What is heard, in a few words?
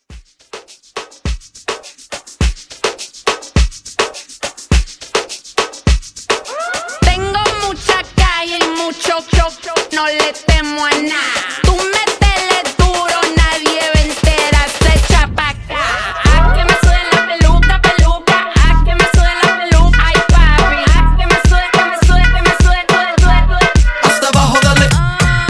Moomba Party Starter 104